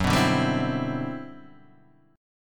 G Major 13th

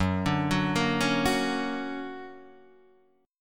D Augmented Major 7th F sharp, guitar

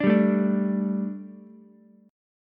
D Suspended 4th D, Ukulele; Dsus4 strummed; JGuitar

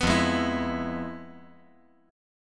F Minor Major 7th Flat 5th

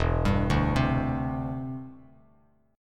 E Minor 7th Sharp 5th, down, Bass Standard

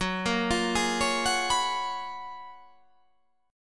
F sharp Major 11th

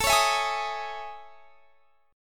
A Major Sharp 11th; AM#11 strummed

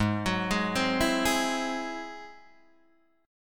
Ab Augmented 7th Ab down Guitar Open D

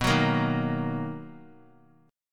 B Major 7th B down; strummed; JGuitar

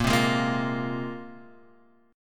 A sharp 7th Suspended 2nd, Guitar, Standard; strummed; 6,3,6,3,6,x; chord; JGuitar

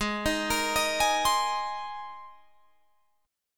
G sharp Major Add 9th, Guitar Drop D

